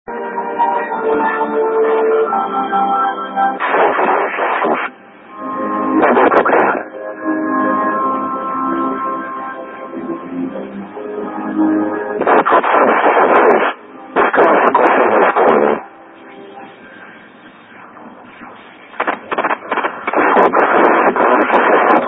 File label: 145.750Mhz FM